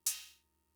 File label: Cymbal; Fricke MFB-522